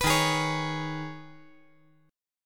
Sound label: D sharp 7th Suspended 4th Sharp 5th